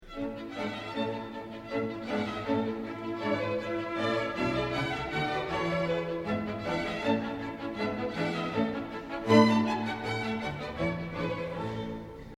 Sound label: Mozart concerto short